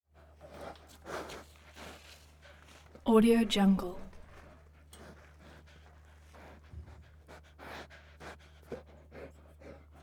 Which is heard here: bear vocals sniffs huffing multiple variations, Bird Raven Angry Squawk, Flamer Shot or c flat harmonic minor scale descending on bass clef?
bear vocals sniffs huffing multiple variations